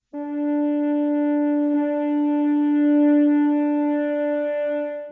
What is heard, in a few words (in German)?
flöte d2 d2; Intervalle, die man durch Potenzen von 2 und 3 darstellen kann